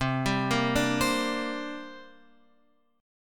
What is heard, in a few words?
C 7th Suspended 2nd, Guitar, Open F; C7sus2 arpeggiated; JGuitar